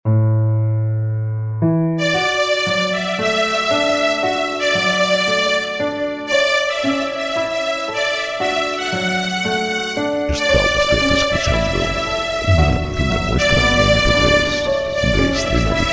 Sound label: violin lent; Música lenta